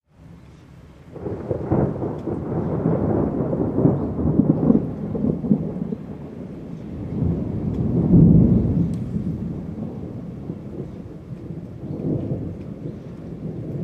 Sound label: Lightning Bolt Thunder Crack; Storyblocks Audio